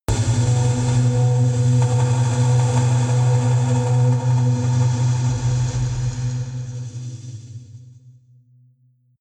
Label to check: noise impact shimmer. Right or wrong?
Right